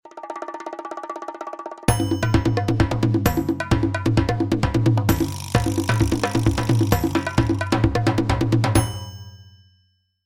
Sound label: Drums